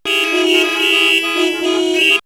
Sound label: Pwr FX; Inter Section; X-Static Goldmine